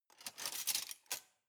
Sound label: cutlery large multiple drawer place soft